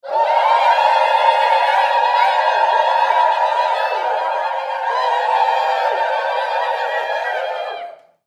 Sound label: Human Crowd Studio; Crowd Reactions Indian War Cry Multiple; Sound Effects:Voice